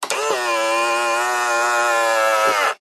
vehicles car suv nissan patrol auto wing mirror fold in motor whirr